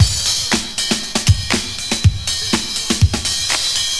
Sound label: Funky Brass, breakcore Breaks